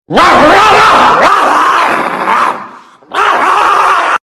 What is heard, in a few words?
Angry doggy; meme sound